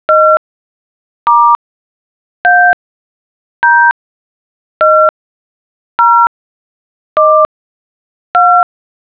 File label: dial tones